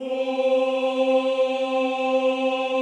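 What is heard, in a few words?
choir C3, Ooh; Ooh 2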